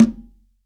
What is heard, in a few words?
CONGA, STEREO CONGA